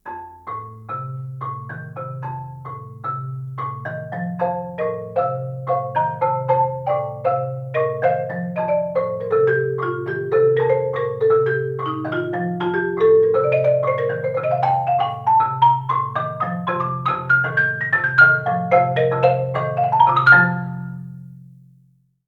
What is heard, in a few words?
jingle, marimba